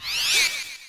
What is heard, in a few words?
Kamek Fly In